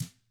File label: TAMA; ILIO; Double Platinum Drums 1